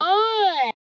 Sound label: formant filter vox hit a, Synthesized Voice Hits